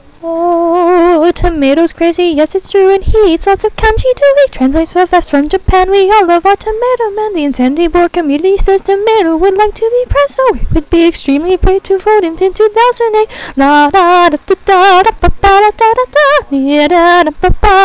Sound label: jingle